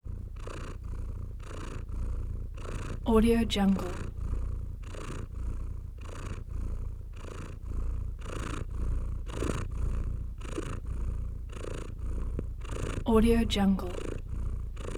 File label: cat purring constant cu breaths raspy fast